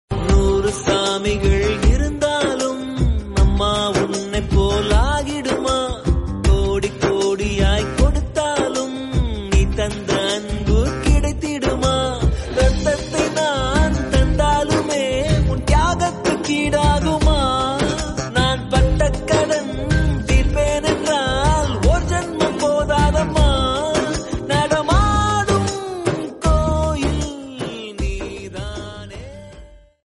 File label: Tamil Amma Songs; Pichaikkaran